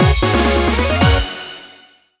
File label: battle level up; 3ds; Miitopia